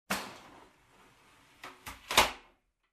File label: Office Door open close